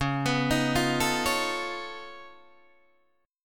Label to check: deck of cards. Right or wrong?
Wrong